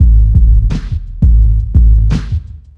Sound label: lowrider loop; auto; Tony Hawk's Project 8; XBOX